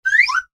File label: comedy whistle slide up; sound effects one